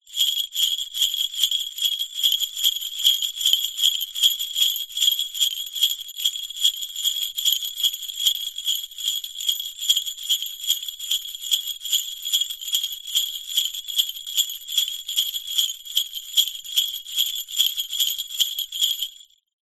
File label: musical large sleigh bells med shake